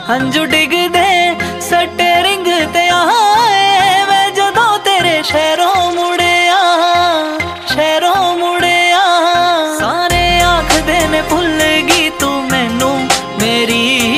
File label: Punjabi ringtone